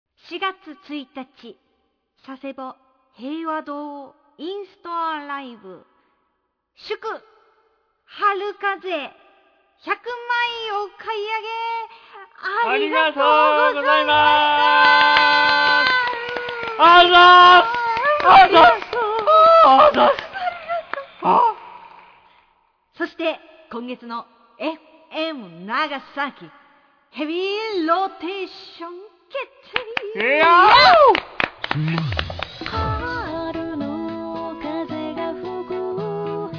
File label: SoundScape